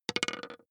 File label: dice small board